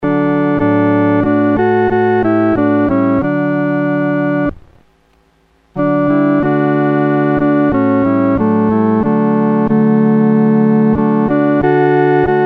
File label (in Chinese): 独奏 第二声